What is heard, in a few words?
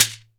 SHEKERE, Synclavier Percussion; ILIO